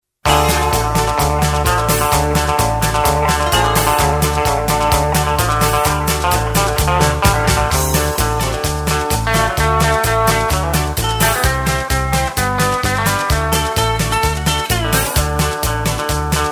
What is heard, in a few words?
music